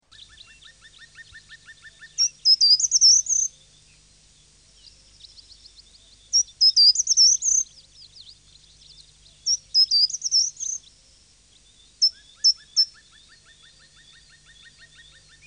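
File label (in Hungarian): Rövidkarmú fakusz, Madarak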